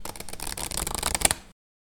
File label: Card Shuffle